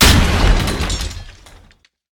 metal break; debris

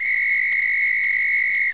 whistle; ae bloodbowl

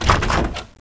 door wood locked; bigcity

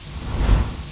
fan

